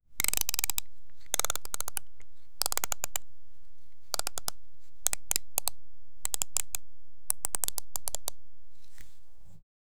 a close up recording of a, toy

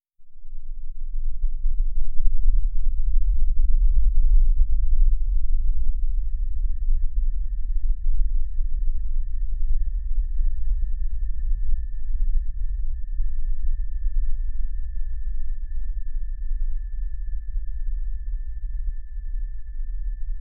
a low sustained fibrous g, organic

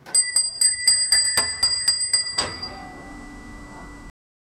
door opening with bells, shop

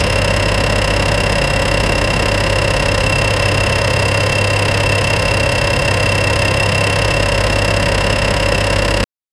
old scrapyard diesel gene

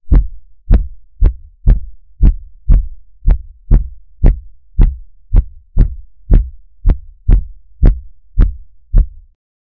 deep heavy heartbeat soun

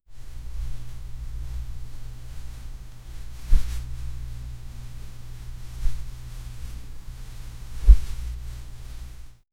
long hair moveing in the

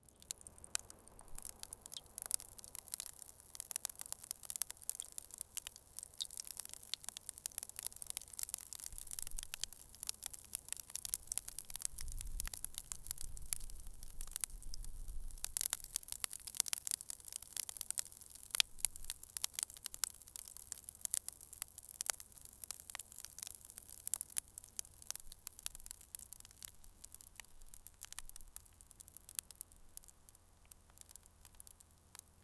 gentle wind soft water dro; snow crunch

